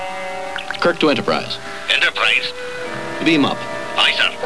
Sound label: beam up; KIRK: "Kirk to Enterprise... beam up."